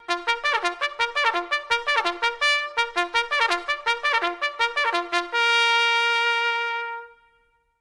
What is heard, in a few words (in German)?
klingelton trompete